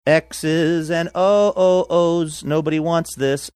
singing ohohohs

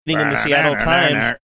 singing opening riff

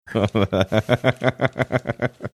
chortling

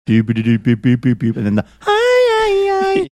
singing in style of doo wop song

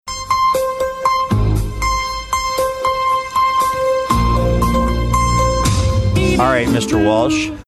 singing few notes saying alright

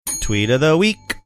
singing tweet of the week

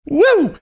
"hoo"

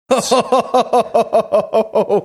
chuckling

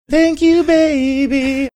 singing thank you baby

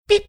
making cute sound

